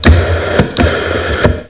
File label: pfffht pfffht